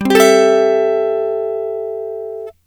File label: Am7